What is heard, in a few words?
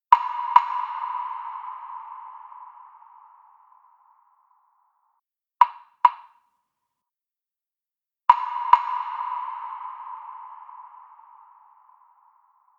Wood Block Ecto Sauna Stereo; Blackhole Immersive